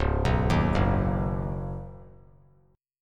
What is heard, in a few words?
C sharp 9th Suspended 4th C sharp down, chord; C#9sus4 arpeggiated; JGuitar